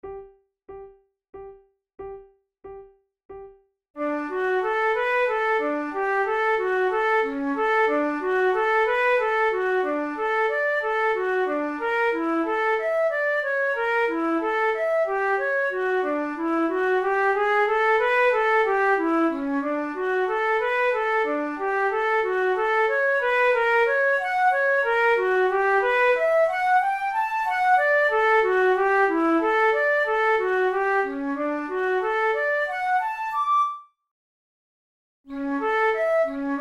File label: flute; school of flute grade1